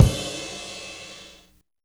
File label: fizzle, Cymbals